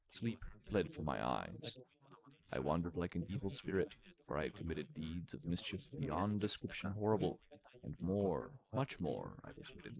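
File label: male, instantaneous mixture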